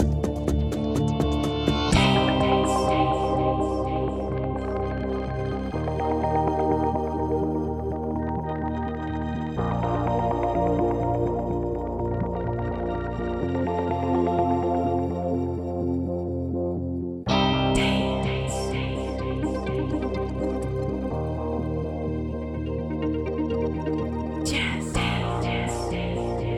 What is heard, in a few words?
Music pause; Just Dance 2021